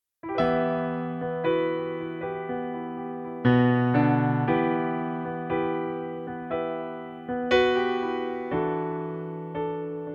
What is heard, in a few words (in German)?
Pianoplayback E-moll